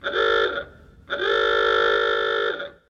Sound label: Car Crash Horns; the 39 steps